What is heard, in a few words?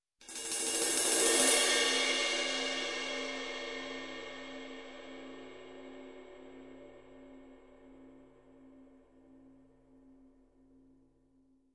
Cymbal Swell